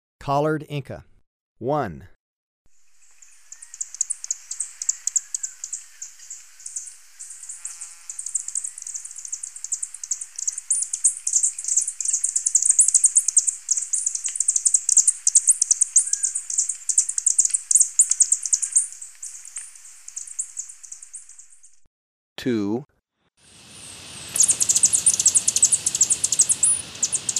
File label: Collared Inca; S. America